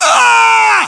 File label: death, english